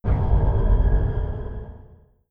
distance railgun fire, weapons; Stellaris

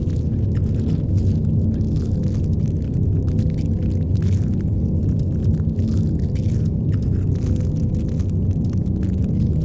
pro ship titan idle; biogenesis

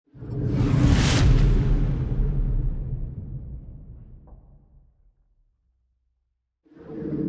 destroyer move; aquatics

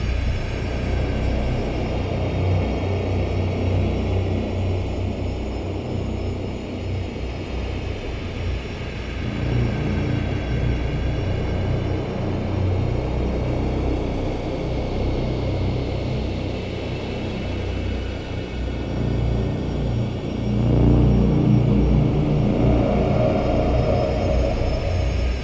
ship colossus idle; synthqueen; Stellaris; machineage

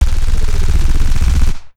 auto cannon fire small; weapons; Stellaris

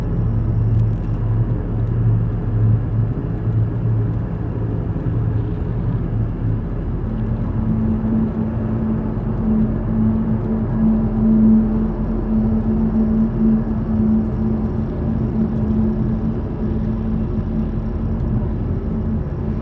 corvette hum; nemesis; Stellaris

